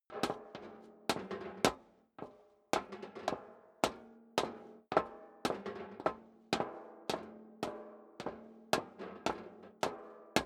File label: step high heels metal rattle upstairs sequence; schritte